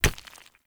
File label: Unarmed Punch Impact Rock